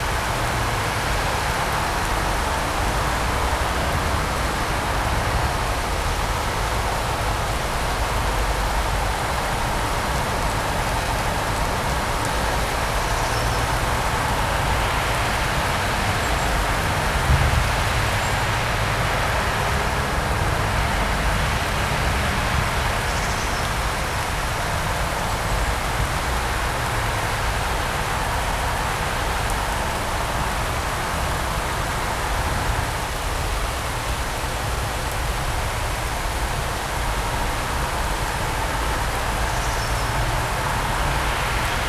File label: Rain and Road Noise Rainy Traffic Noise